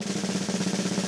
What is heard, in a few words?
roll, Steve Gadd Drumscores